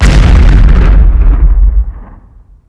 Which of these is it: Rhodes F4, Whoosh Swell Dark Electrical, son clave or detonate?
detonate